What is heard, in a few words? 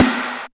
Snare, Alesis DM5